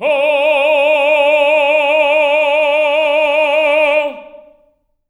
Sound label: TENOR F D4; Spectrasonics; Symphony of Voices